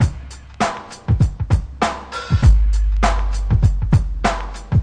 supersub cracker beat, drum loops and breaks, 96 bpm; 091 to 100 bpm